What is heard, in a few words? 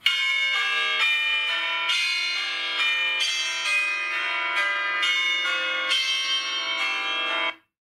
iPhone 12 Bell Tower IOS14